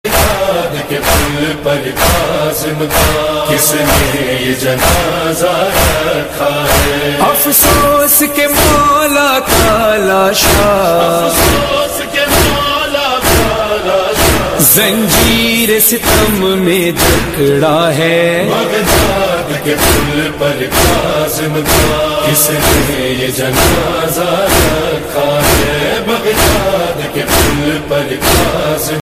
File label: noha tune